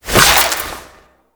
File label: Equip, Weapons, Sentient; Warframe; Shedu